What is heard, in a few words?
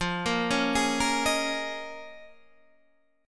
Eb 7th Suspended 2nd Suspended 4th